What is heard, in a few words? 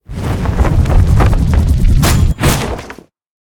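dead book attack; monster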